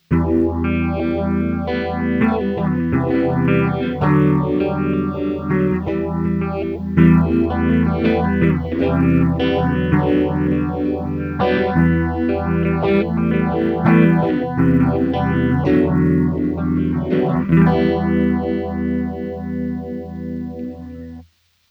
Orange Gitarren Effektpedale Phaser Mid Speed

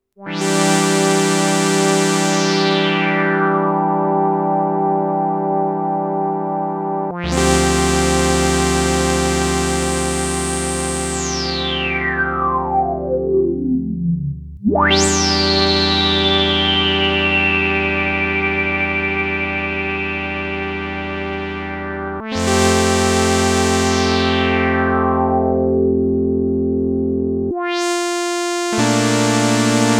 Polymode mit Detune

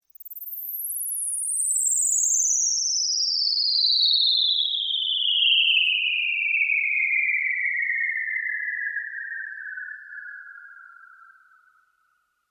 Opus 3 Filterzwitschern mit Panning

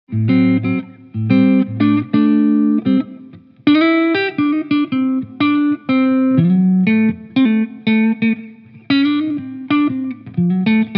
Barncaster Hals clean; Tarot

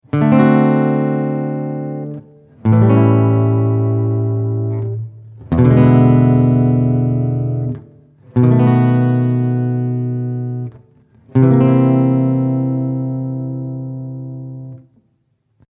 Septakkord D7 A7 E7 B7 C7

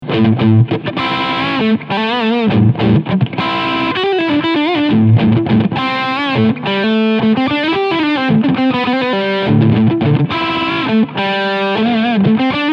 Fender 50s Tele Leadsound Steg PU; JV Modified

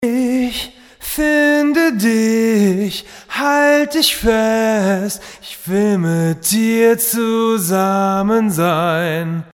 Gesang original

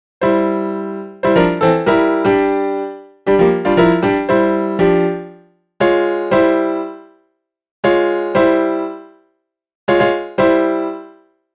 Klaviersatz

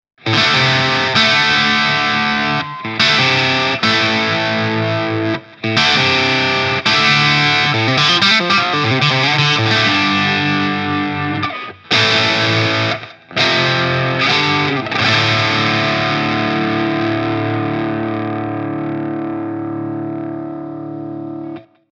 Stegpickup crunchy

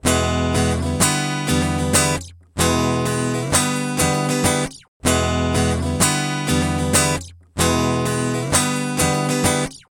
Les Paul offen Chandler EMI REDD47

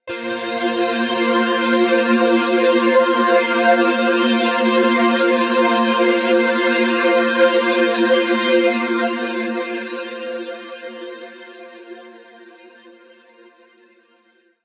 Saw Pad Shimmer und Chorus; Dawesome LOVE 1.0.1

